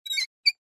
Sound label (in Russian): fljuger